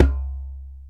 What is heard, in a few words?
Djembe Slap, drum machines